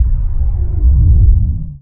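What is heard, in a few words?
Laser Fire Interior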